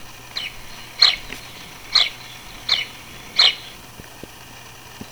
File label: House Sparrow